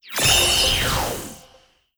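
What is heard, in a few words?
Score Battle Start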